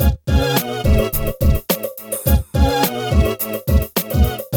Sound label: BEAT, Beats 105-150bpm; PlatinumPhattCD1; E-MU Producer Series Vol. 8 – Platinum Phatt